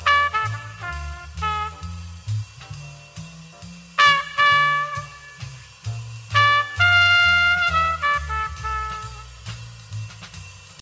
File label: Elevator Music